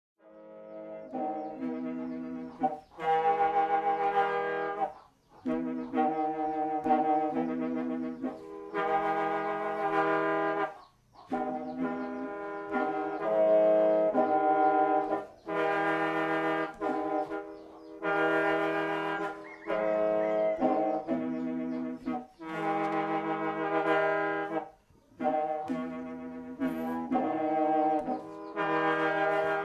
Black Lahu bass free reed pipes, these are the largest free reed pipes found in Thailand; Lahu Na (Black Lahu)